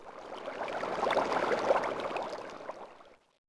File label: bubbles; Feeding Frenzy